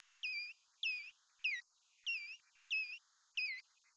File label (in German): Nyctalus noctula Isolationsrufe hoerbar 4x verlangsamt; Fledermaussprache